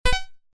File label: Retro increase